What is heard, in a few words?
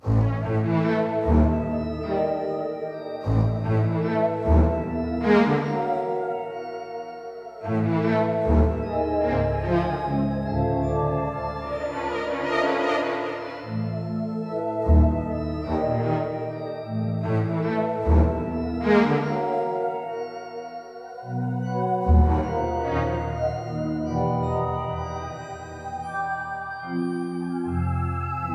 Cave, Dark; Pikmin 3 Deluxe; Vehemoth Phosbat Cave - 4 Bulbs